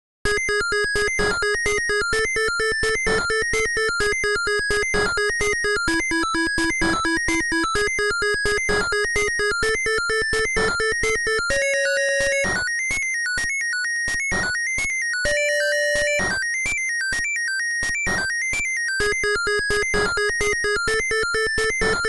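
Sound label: music score, Game Boy Color; duality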